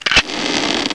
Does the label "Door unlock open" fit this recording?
Yes